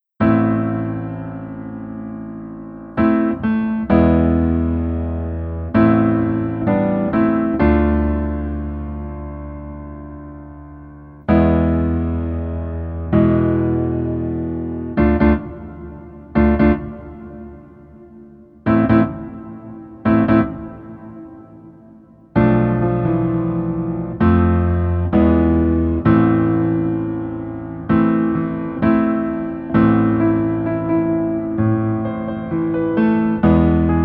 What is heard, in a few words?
Piano